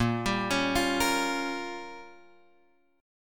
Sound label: Bb Minor 6th, Guitar, Drop D; 8,10,11,10,11,x